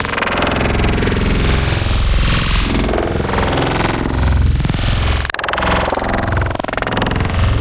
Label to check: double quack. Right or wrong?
Wrong